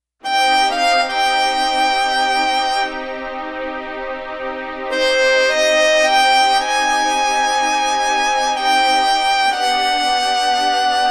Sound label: Strgs Violin